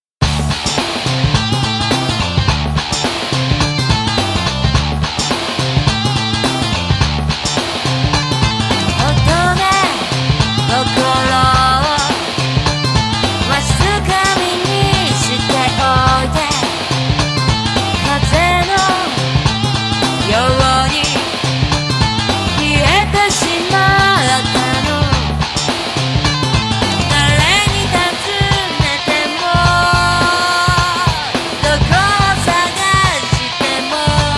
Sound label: Karaoke Box BGM